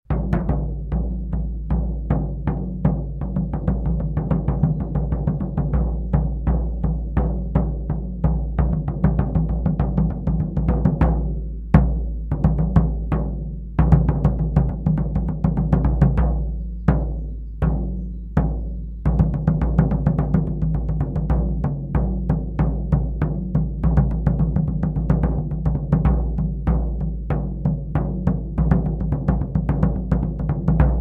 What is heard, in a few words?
Drums Civil War March; ultimate general gettysburg gamerip 2014